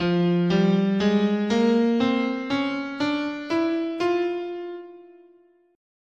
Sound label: F Bebop Melodic Minor, scale